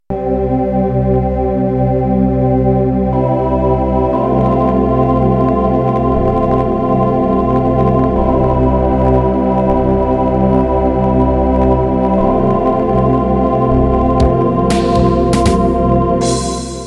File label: Jungle intro